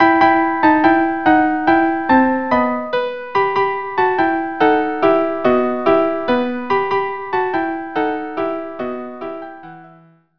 violin viola

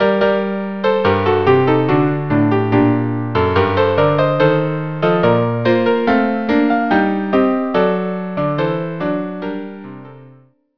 Courante; almira

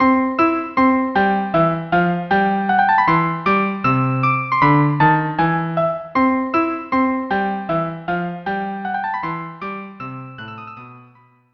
violin cello

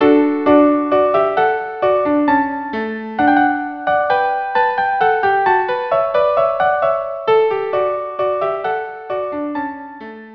violin duo; Te Deum

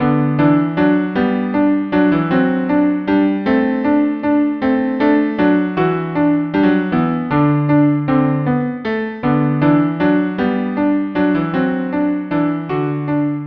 La Rosa violin cello, Sicilian folk song; Violin and Cello; La Rosa (violin cello duo)

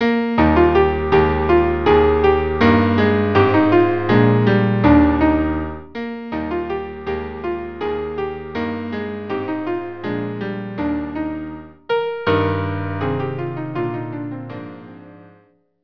quartet

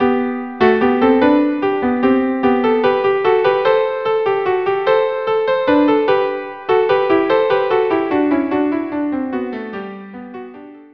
violin violin duo; shafer

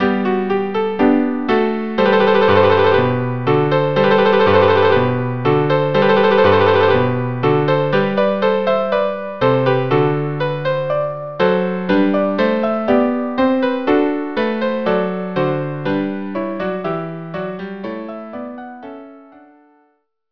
trio

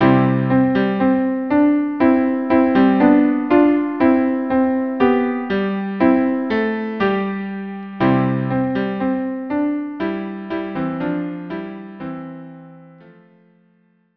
viola viola, duo; mi yemalel; who can retell viola duo

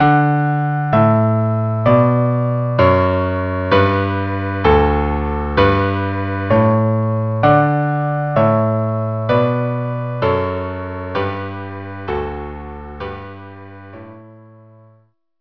Canon Pachelbel trio

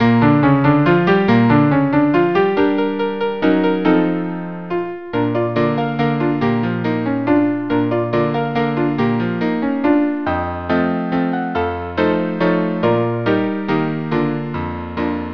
waltz

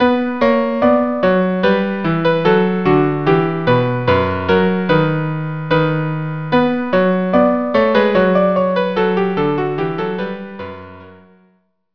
Almain

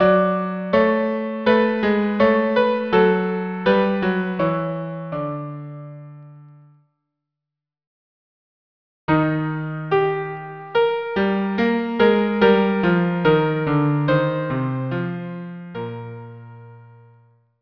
Chorales violin cello; Two Bach Chorales

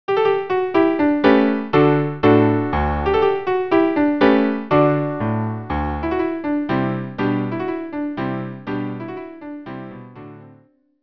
Minuet quartet; Grandmothers

